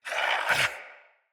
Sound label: Pain Knight Male; Mendicant